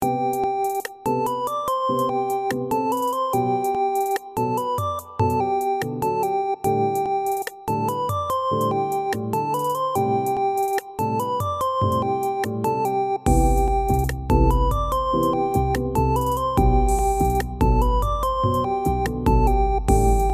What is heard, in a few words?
game music loop